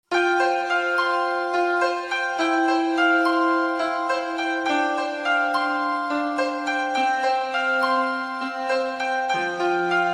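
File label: electric piano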